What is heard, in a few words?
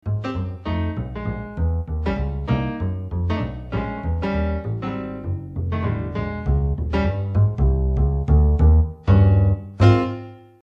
bass piano